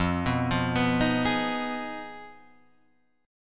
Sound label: F Minor 7th Flat 5th, guitar chord; arpeggiated; JGuitar